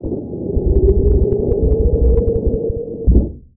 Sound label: Haptic Train door close